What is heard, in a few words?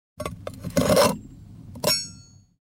metal, gnissel